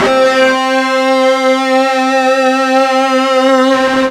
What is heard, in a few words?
FUZZ C 3, ULTRA FUZZ, Guitar; East West; Steve Stevens Guitar Samples Collection